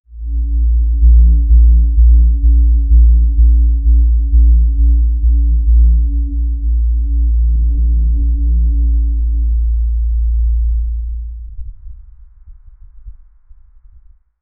vibration underground

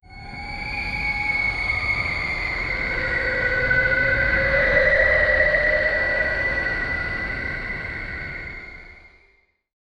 spooky

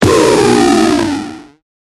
damage sound like the doo

